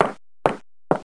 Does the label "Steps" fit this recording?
Yes